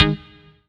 Synth Shot G#

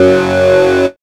HARD Gb2, dubstep; Jack Sparrow; Deep Dubstep Progressions

